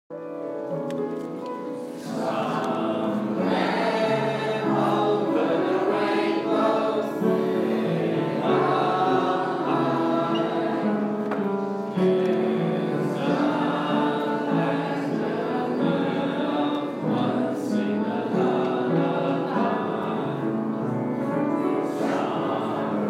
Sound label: school choir; lansdowne